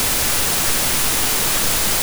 Thermal noise; sound effects and sound reinforcement

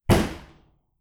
one thump; sound effects and sound reinforcement; The Passion of Dracula

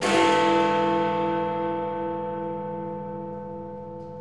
ben strike, church clock strikes; sound effects and sound reinforcement

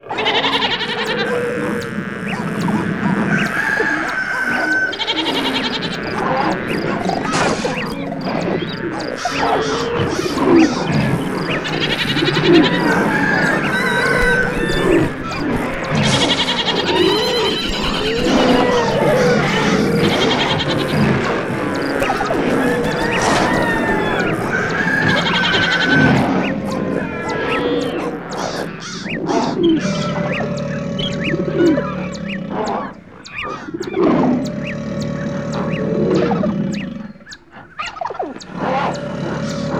animals move into ark; sound effects and sound reinforcement

